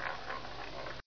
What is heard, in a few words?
soft Loopable